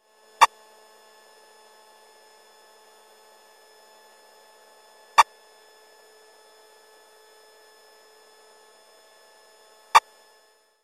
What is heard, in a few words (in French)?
Radar d'aeroport; Ondes électromagnétiques et électromagnétisme